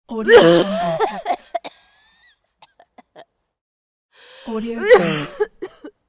strong female cough

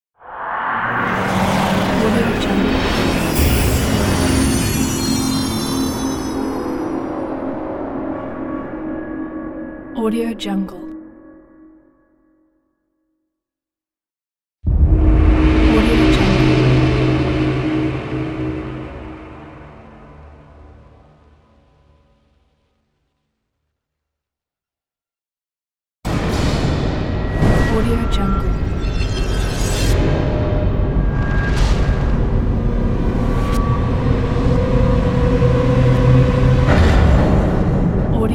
horror mystery sound pack

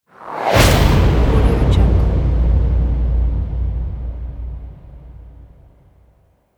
cinematic hit

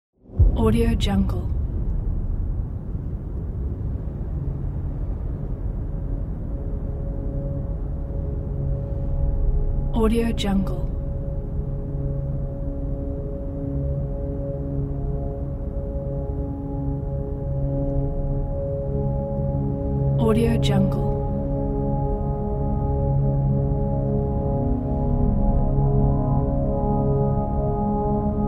drone space; archisound

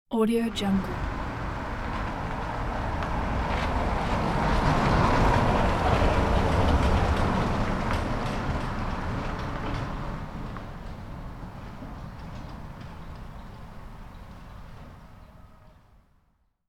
slowing downhill car passby

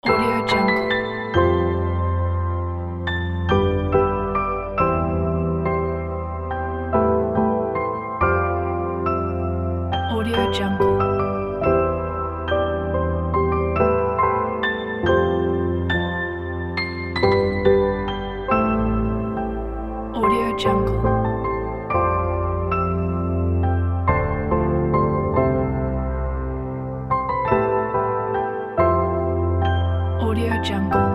piano roll

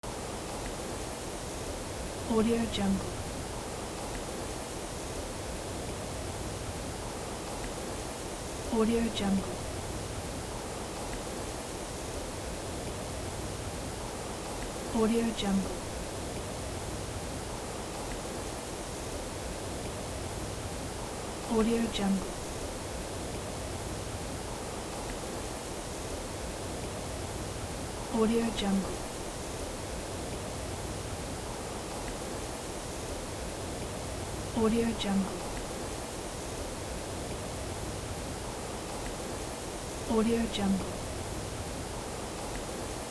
quiet forest

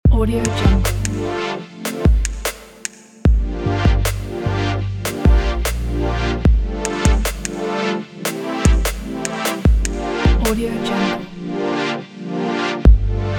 chilling waves; itspeaker

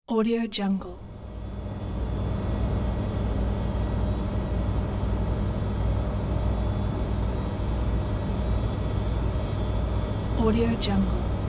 night street conditioners

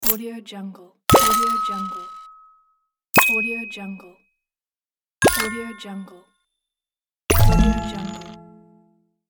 coins buy

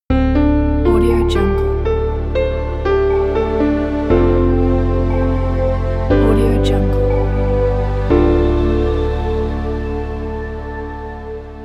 inspirational emotional piano logo; mikekoylo

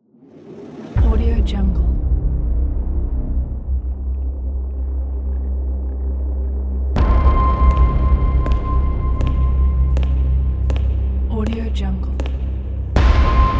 dark cinematic horror trailer; madbirdprod

